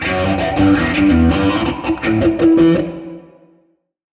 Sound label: Fuzz Wah, Guitars; Rock